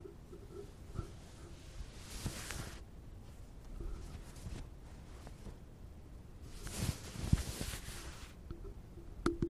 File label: Clothes